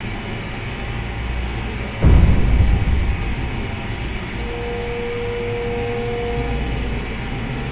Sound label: Refinery Amb Booming